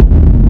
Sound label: Industrial Techno Kick oneshot F; kick drum